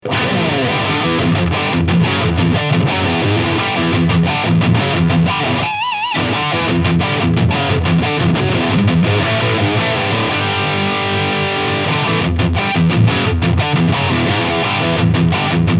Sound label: Epiphone JC Bone White High Gain